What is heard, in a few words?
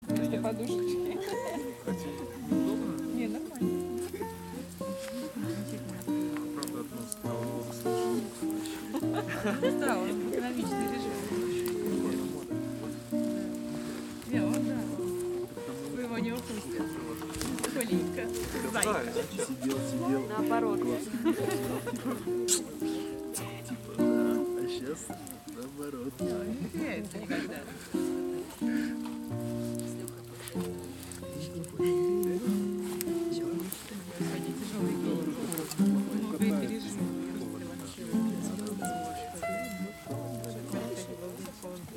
Instrumental